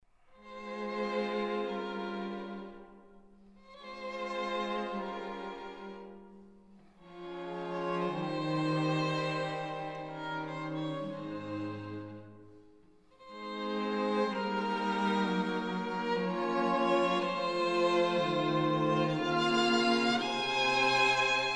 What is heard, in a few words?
quartet; goldmark